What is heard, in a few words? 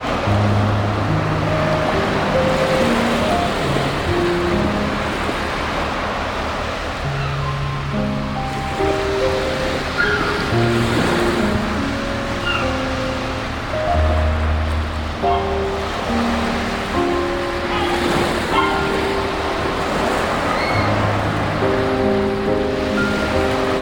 ocean